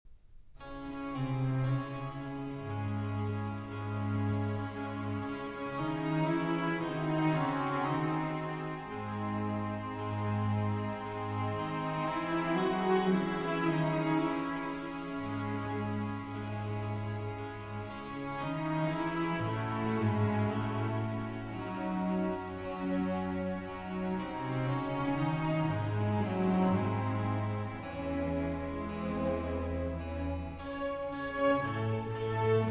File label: Violin Concerto No. 2 in E Major; Bach, JS; BWV 1042